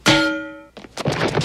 bang; uhf

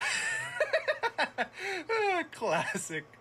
laughing

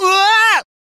yelping; sonic

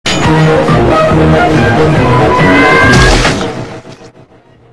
jumpscare; train eater

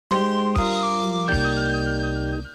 welcome jingle

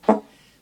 uhf weak horn

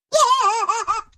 funny laughhhh

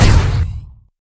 lasgun

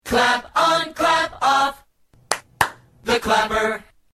the clapper; clap on clap off

